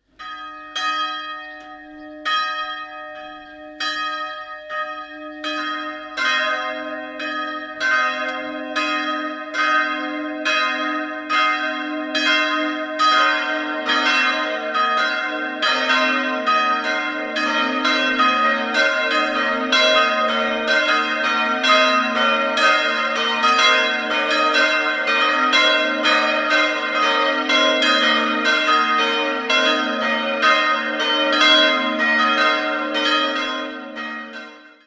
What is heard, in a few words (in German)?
Oberbuchfeld, glocken